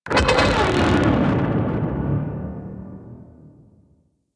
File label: Rail Jack Fighter Initial Death Explosion; Enemy Ship; Corpus